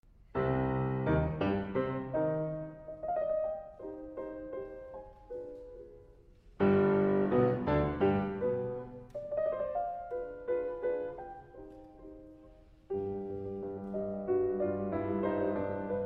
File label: Piano Sonata No 14 in C Minor Molto Allegro